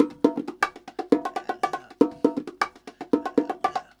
con, Percussion; Big Fish Audio - DOM

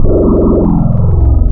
FUZZ, wavetables Classic Synths

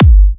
G, Kicks; markus hakala

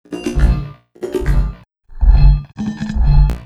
AFRIKAN; USB Soundscan vol.33; AKAI; Disjointed Textures; RYTHME

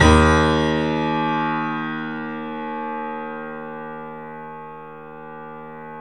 D#, 3-D Audio Collection, 3D Pianos, Yamaha C7; E-MU Producer Series Vol. 5

